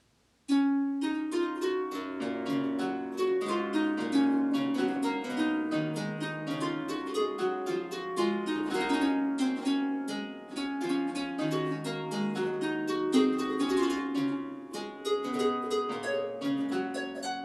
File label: harpsichord